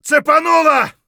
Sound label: hurt light; Escape from Tarkov